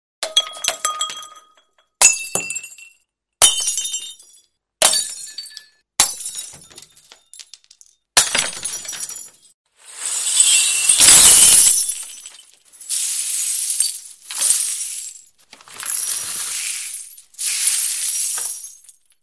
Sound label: Glass Crash